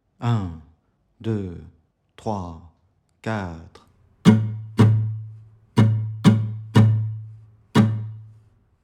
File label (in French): exercice 060bpm 1 mesure